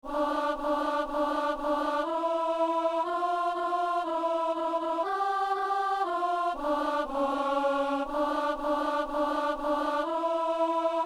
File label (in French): messe sanctus alto; messe de saint jean